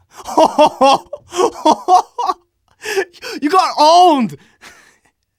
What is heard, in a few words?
laugh happy; Scout